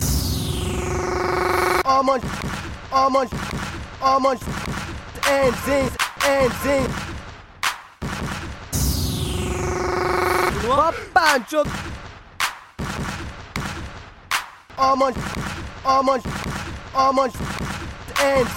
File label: norwegian ring